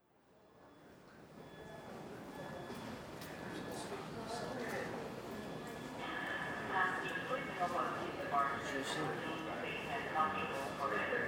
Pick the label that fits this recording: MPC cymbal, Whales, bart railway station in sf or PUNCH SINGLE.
bart railway station in sf